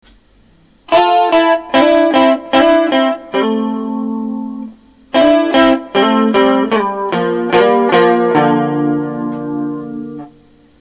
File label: chorus